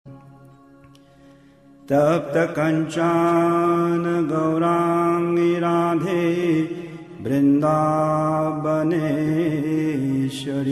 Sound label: Sri Radha Pranama Sung; HH Bhakti Swarup Damodar Swami; Pranam Mantras